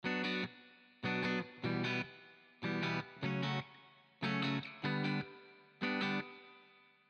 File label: strato wet; Chiqui Audio; Free Wet Chord Progression 9 150 Bpm B Min; Fender Stratocaster